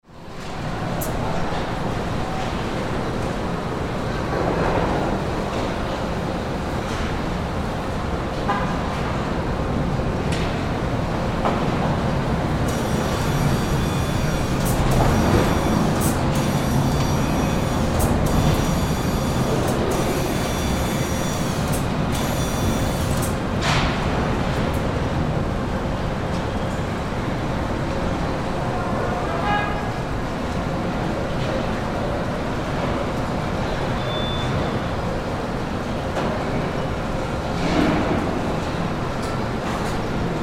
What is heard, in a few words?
Factory Ambience, Factory, Ambience, Assembly, Plant; Workers; General, Motors